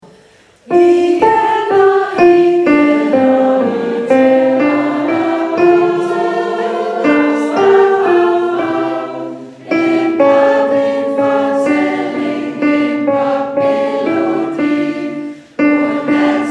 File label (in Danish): ALT fortabt vers